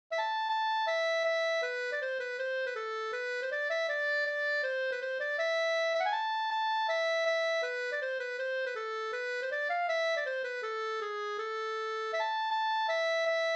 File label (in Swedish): schottis; e Johannes Hilli Jeppo Ob fi